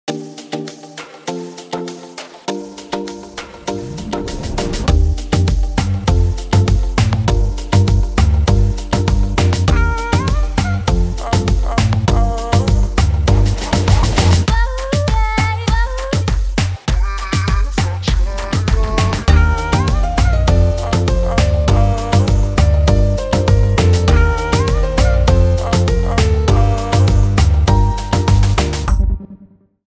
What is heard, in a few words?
SUMMER BEACH GROOVE